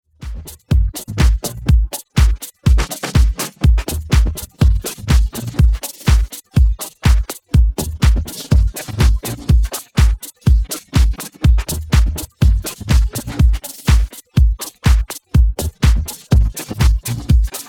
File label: Drums